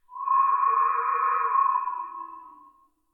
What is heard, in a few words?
Cool Wind